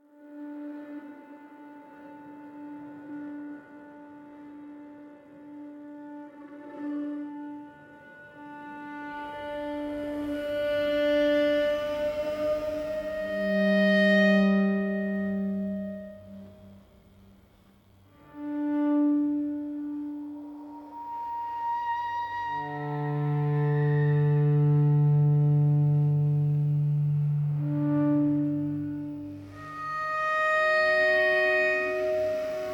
Glass House Drift, spiritual spooky soundscapes

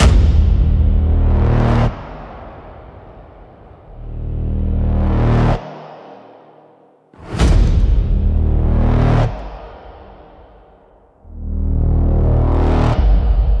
japanese drums battle rhythm; for power tension battle and heartbeat

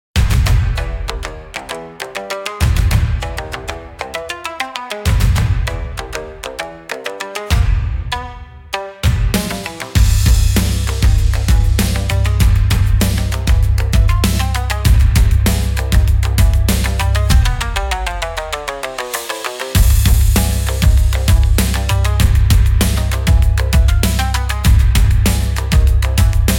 Kamikaze Run, cinematic japanese style battles

